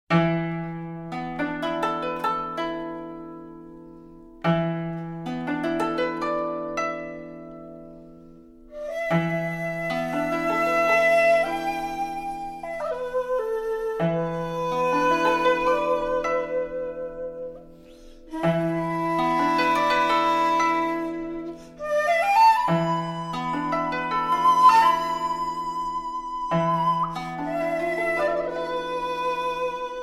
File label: music